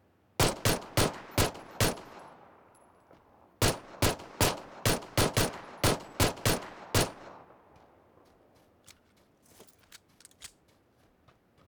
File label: P92 shots